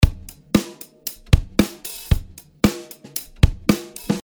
Punch; H-Comp

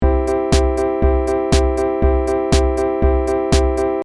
Piano long

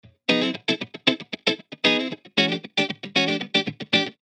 cutting bypass

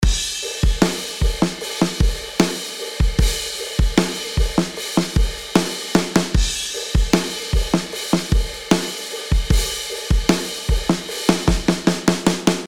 drums before

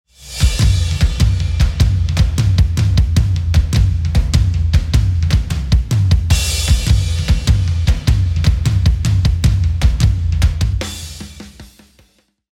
drum bus

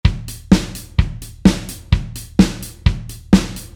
drumbeat kagura

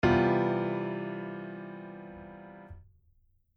cmaj7 f

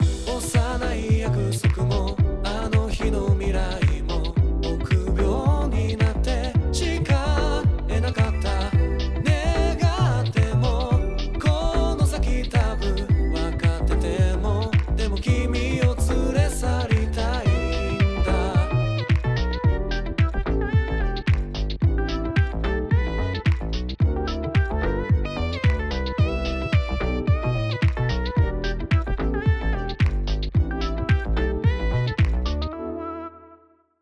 Rev Delay

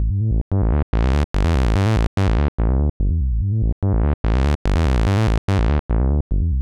Bass

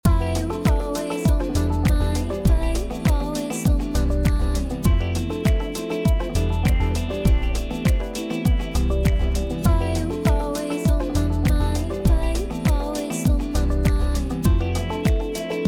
Heavy Comp

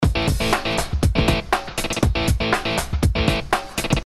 gt chop

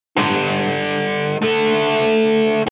Slide

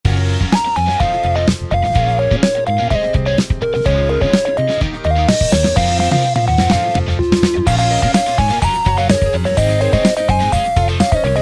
Drums

